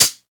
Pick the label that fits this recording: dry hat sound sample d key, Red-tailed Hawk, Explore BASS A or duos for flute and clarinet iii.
dry hat sound sample d key